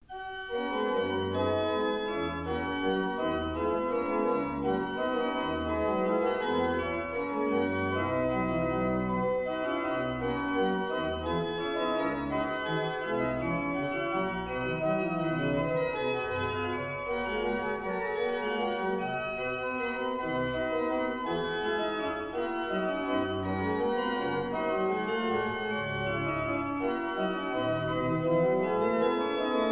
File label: pipeorgans; Mertel